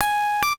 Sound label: Beep-Zoom Out; SKY IM-S400L; System Sounds